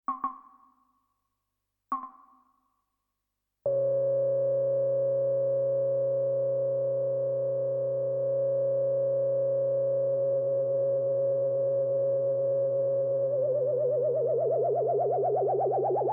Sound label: roland sh 2000 c ufo